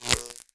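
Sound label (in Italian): Elettrico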